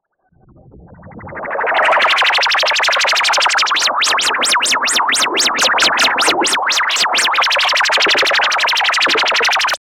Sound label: lfo fx